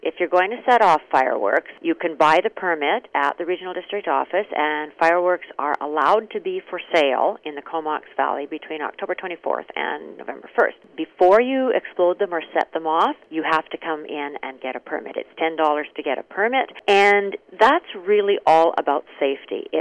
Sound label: FIREWORKS; fireworks rules and regulations